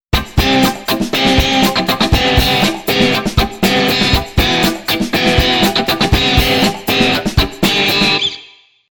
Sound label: real strat backing